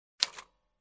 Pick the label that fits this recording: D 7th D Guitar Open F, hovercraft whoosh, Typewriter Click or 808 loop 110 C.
Typewriter Click